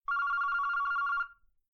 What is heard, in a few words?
ringtone